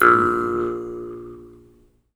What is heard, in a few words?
Jaw Harps